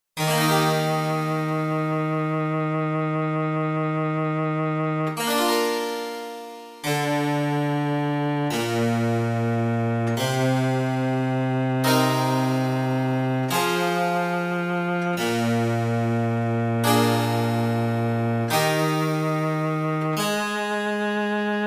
oboe sonata; handel; hwv364a

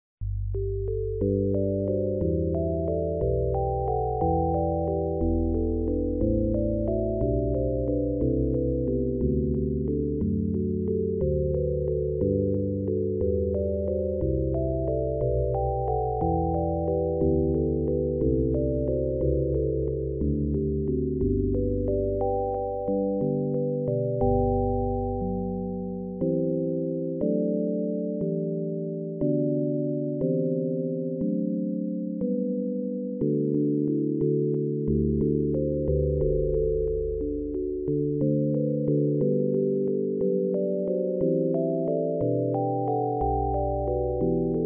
cantata147 bell

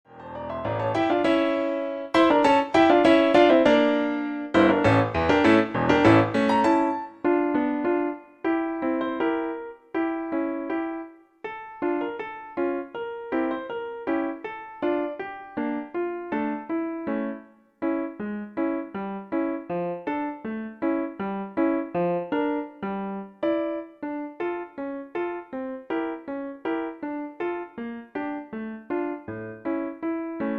clarinet sonata; brahms

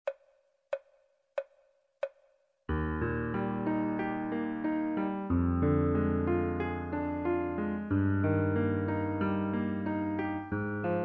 clarinet sonata; brahms

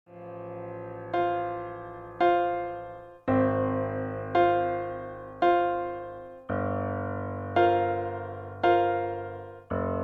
arpeggione sonata

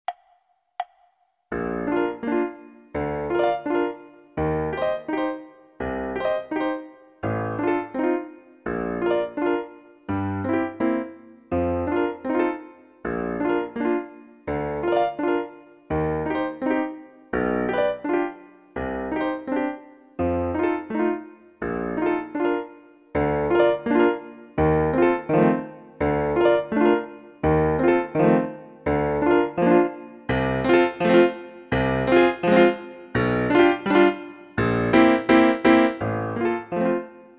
fruhlingslied cl b-dur; mendelssohn

